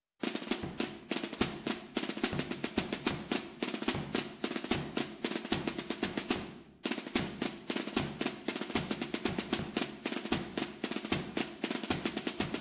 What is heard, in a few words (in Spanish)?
imagenes, tambores; Albalate